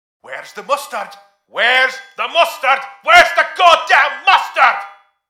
faster

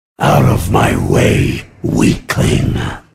dead, grunt sounds

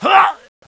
pain; bts rc; construction

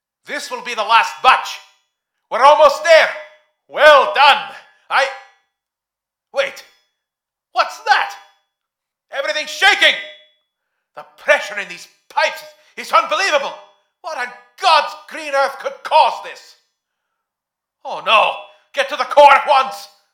boss arrives

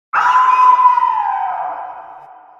AWOO; sven; player; spess; Kagerou

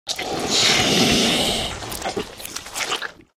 zombie eat; russian; sven; russian sounds

